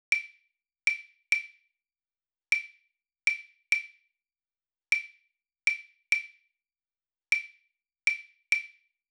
Clave

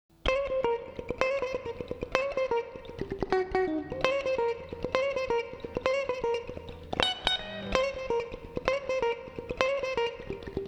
PIGEON FUNK

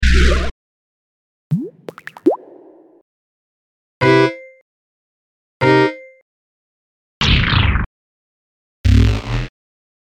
Secret Sound Pack

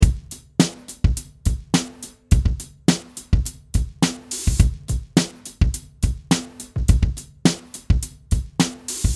beat

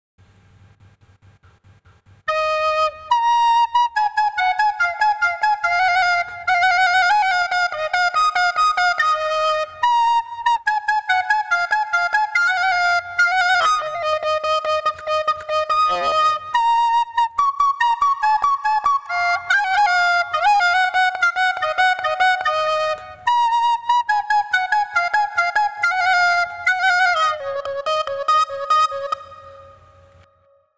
Unknown Folk

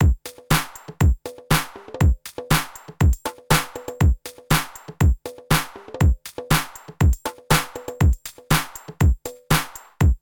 Band Drums Crystal Kit 120

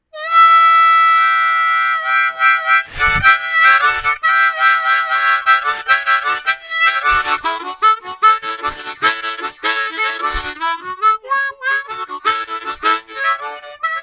Blue soul with Harmonica

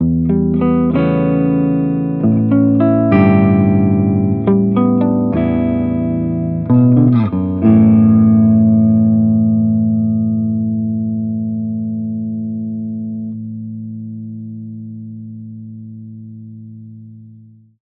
A GT Riff

